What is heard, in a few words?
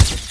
camera flash sound effect